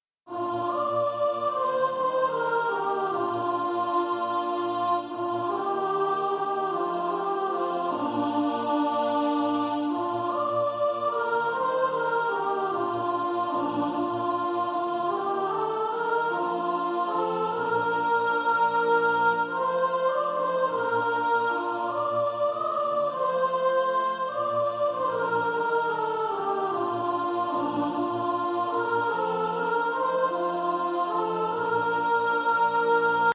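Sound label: soprano; One sweet solemn thought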